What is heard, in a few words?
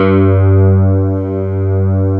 FM STRATA; FMSTORY#1